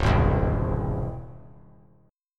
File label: Db Minor Major 7th Db; DbmM7 strummed; JGuitar